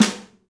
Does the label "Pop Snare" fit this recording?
Yes